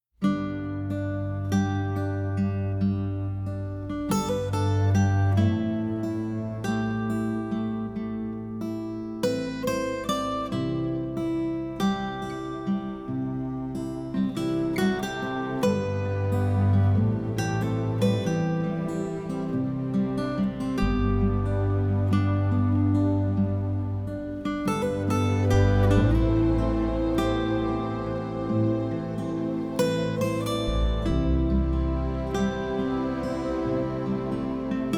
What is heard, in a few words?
Romantic Comedy